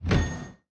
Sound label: train inventory move; factorio 2.0.55